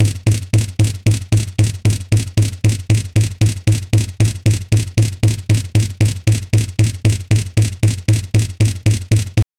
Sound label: harley davidson heritage, motorcycle